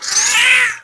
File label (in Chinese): 海豚; RA2; 西木工作室; 海豚(红色警戒2)语音